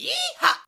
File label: "yee haw", scream; ooh ahh